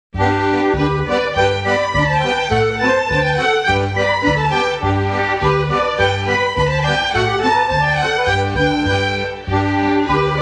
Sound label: Polka